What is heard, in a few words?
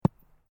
Sport Ball Rubber Bounce Grass; Bounce Sound Effects; Objects